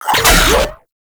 Infested Shotgun Low Clip; Weapons

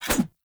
Large Dagger Whoosh; Weapons; Enemy; Daggers

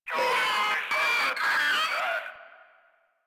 Proto Infested Runner Vocal Attack; Warframe

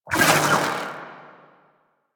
Vocal Pain; Warframe; Sentients; Conculyst

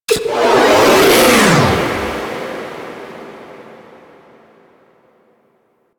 Dematerialize; Warframe; Clan Dojo; Dojo Void

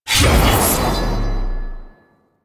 Backstab Cast; Warframe; Warframes; Pax Duviricus

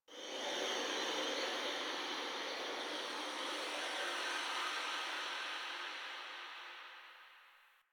Landscape Wind Atmosphere Anomaly, Ambience; Warframe; Venus Open World; One Offs

